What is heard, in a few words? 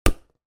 sport ball soccer bounce hard concrete ground